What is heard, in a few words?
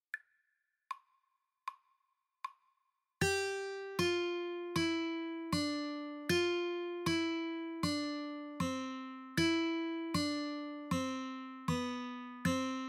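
strings 1 2 quarter, guitar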